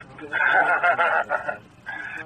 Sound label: scary laughing hahaha